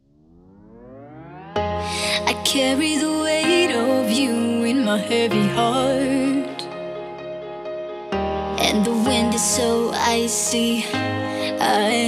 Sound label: Remix